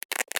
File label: cloth fiber rip